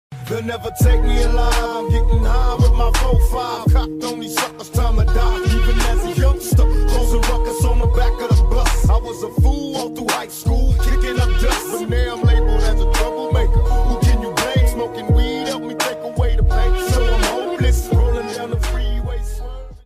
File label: Classical Song Ringtone